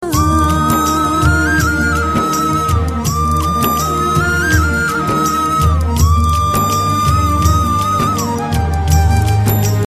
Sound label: Flute; Flute Mp3 Ringtones; Funny RingTones